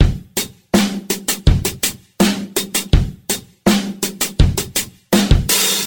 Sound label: ripitlikedis, Drum loops Boombap